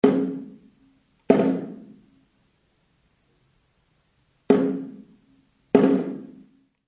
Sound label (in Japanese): 磁鼓